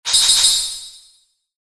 ランク レア度3 モノラル

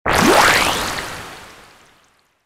水魔法; 魔法効果音; RPGなどに使える効果音です

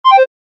場面切り替え 笛風

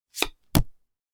カードを裏返す; board game casino mahjong

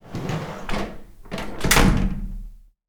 風呂のドアを閉める その1; 日常生活の効果音

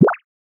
ぴょこ

